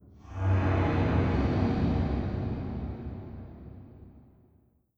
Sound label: vox wet, strider, combat, idle; creatures